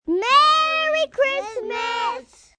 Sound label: Kids Merry Xmas, Cheering, group of children; Party Sound Effects; VoiceBox; Twistedtracks